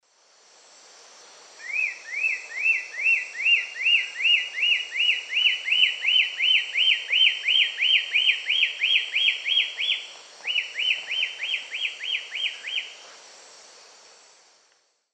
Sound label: Myrmornis torquata, song; S. America; Eastern Lowlands